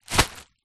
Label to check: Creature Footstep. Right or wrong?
Wrong